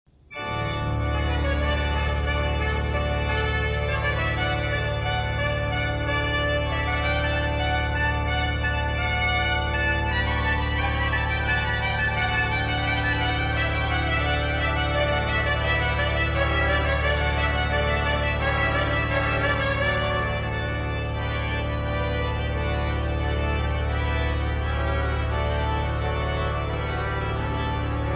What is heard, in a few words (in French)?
Trompette